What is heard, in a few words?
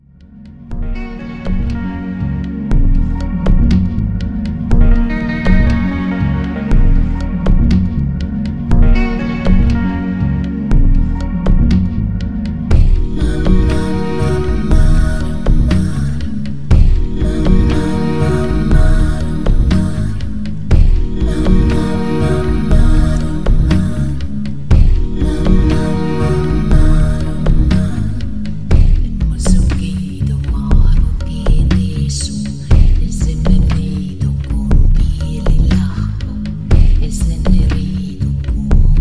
beat of life